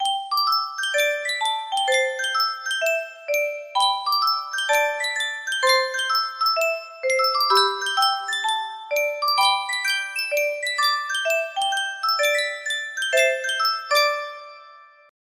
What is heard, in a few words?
music box, music box melody